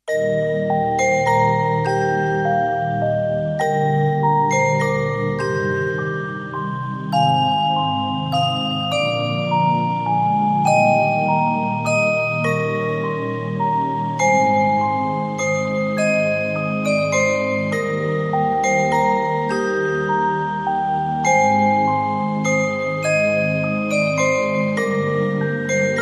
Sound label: Stille Nacht, Carillon